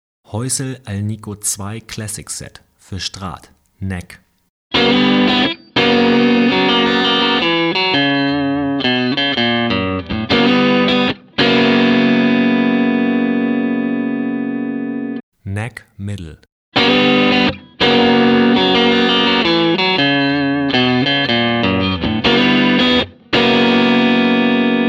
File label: Häussel Alnico 2 Classic Set for Strat; alles-ueber-pickups